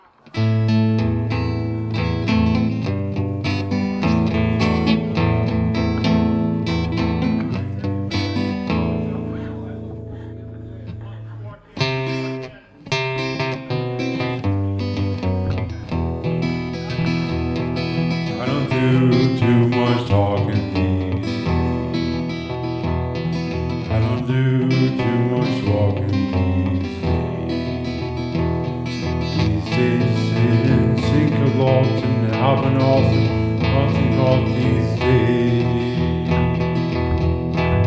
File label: Jam